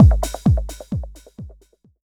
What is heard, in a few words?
END, Tekno Industrial; East West